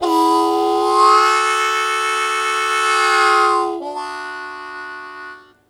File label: Blues Chord Attack D1, Harmonica; 90 s Sample CDs; Zero G